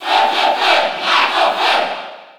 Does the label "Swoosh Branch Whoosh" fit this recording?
No